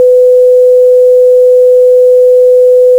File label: 6bit sine dithered